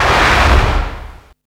War FX, Hard & Loud Techno; USB Soundscan vol.01; AKAI

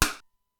Simmons SDS-9 drum machine; Factory C5

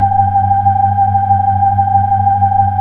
B-3, Hollow

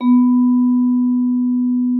VIBES; Xsample VOL-4

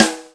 SNARE, Ensoniq ZR-76

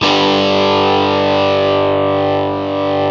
GTR Crunch, Dirty; Club-50 - Foundations Roland

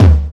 Kick, drums; Sonic Foundry (Sony Creative Software); Drums & Drones; Construction Kits; Squeeze Kit

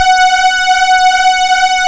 scraper F#4; Best Service Alpha Dance vol.2

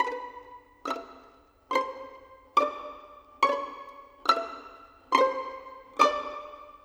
Pizzicato, Orchestral; Sonic Foundry (Sony Creative Software); Orchestral VOL-2

